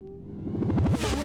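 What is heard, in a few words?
Helicopter Reverse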